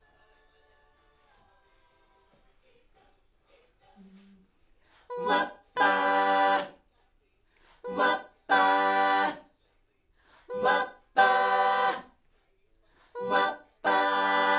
Backs AKG

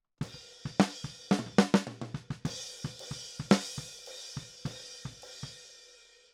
Snare

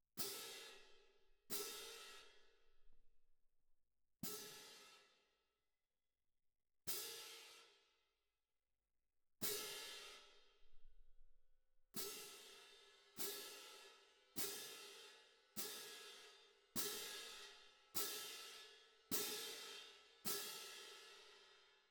Perc

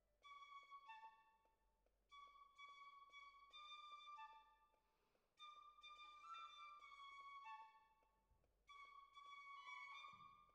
Violas, jazz for piano

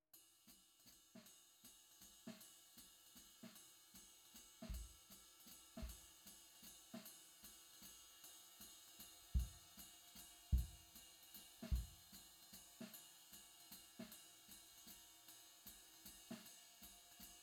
Kick IN

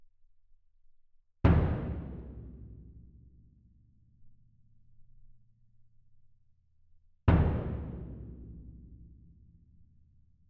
Perc

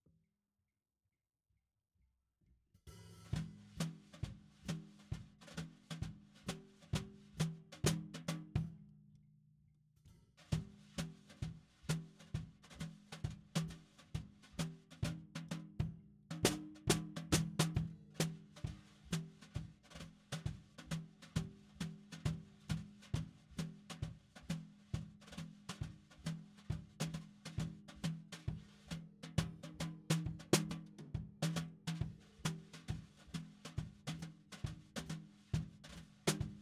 SNR BTM

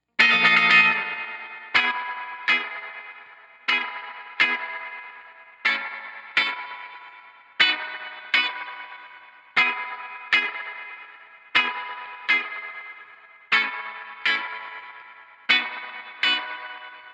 E Gtr

